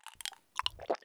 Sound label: item pills bottle use; Escape from Tarkov